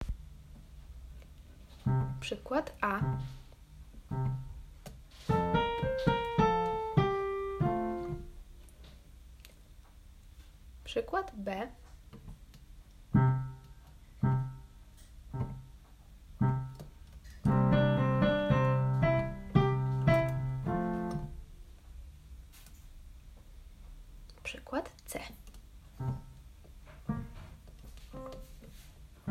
który rytm

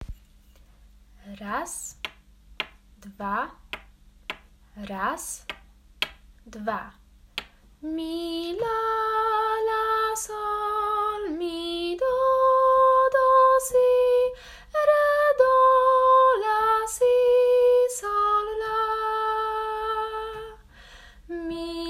melodia angielska

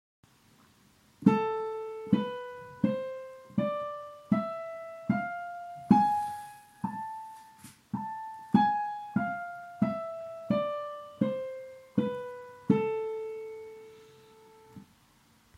amoll harmoniczna

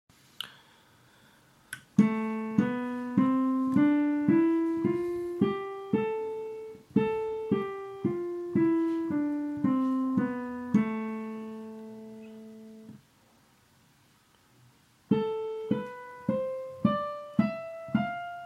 gamy molowe ćwiczenia

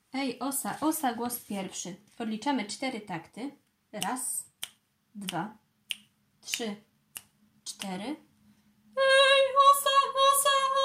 ej osa osa głos

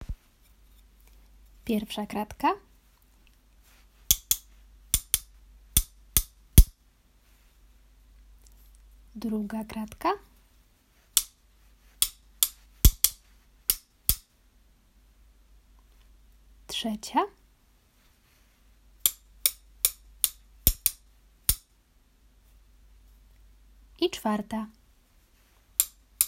rytm

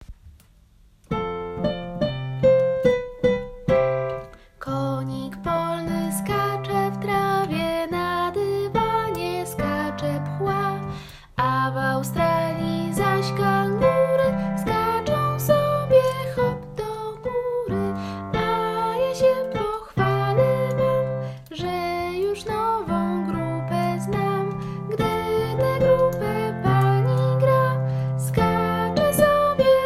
piosenka z podskokami

